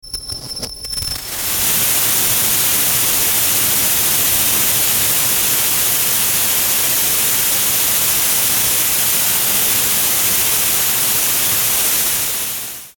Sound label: static feedback; Static Feedback Ring Distortion; Production Element Imaging Element Accent Transition; Blastwave